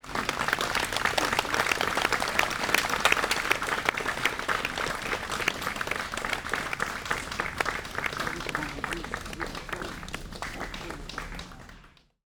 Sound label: CROWD Reaction, Small Applause, 20 People, Bali, Indonesia; Articulated Sounds; Bali Ubud Village Ambiences